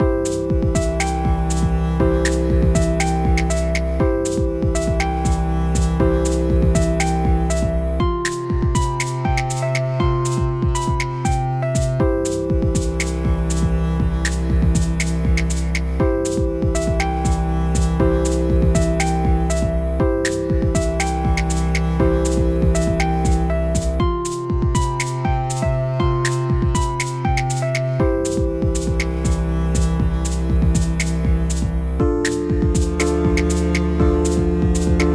Caprese Waltz

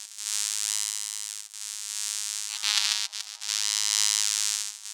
snap loop; Electricity; Electricity Game Sound Pack

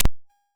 duck eat; Egg Hunter

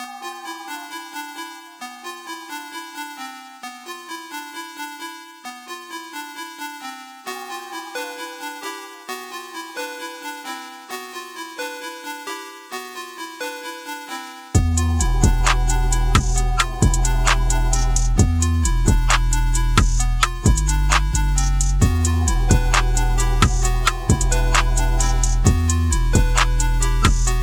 lost in a cave

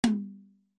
tom

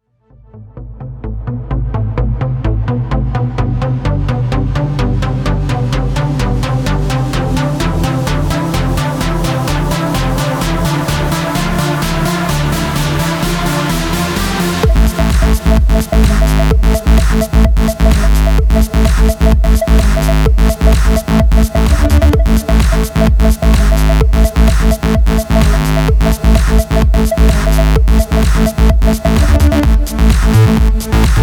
Victory Party

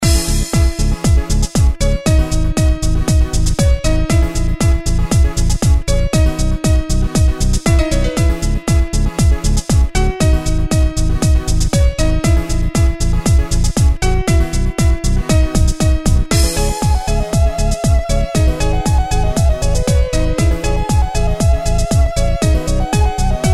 Celtic Dance

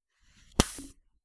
vacuum seal opening

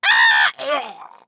Minion Die Spike

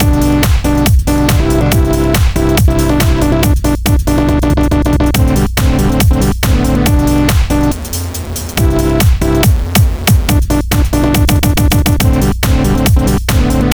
melodic skippy edm

